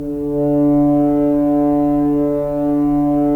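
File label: Solo French Horn, Brass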